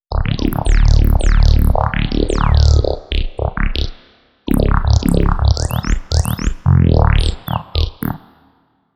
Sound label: wipwop; bass